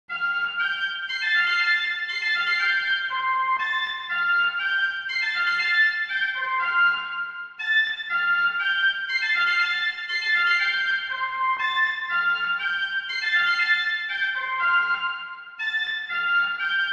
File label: School Flute